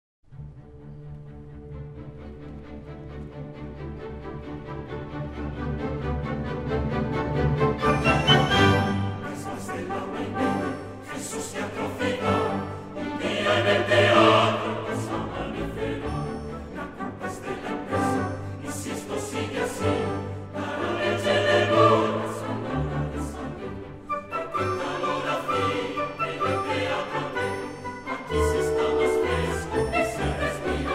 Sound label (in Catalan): Orquestra